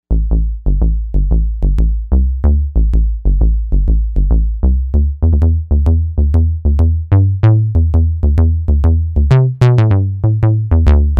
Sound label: Fat Boy Bass; Novation UltraNova; Factory Patches